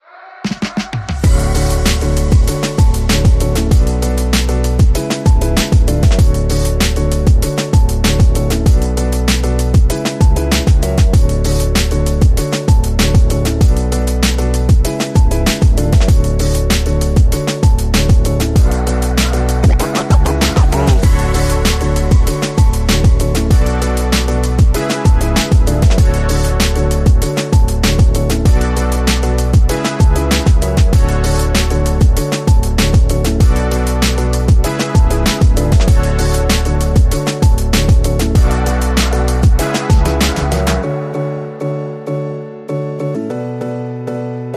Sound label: No Leads No Pads